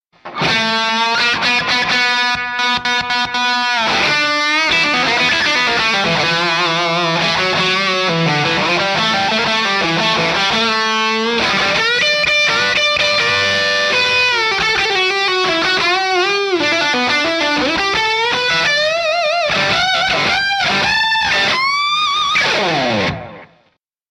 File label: Recording Electric Guitar; Apogee Quartet; SM57; KM86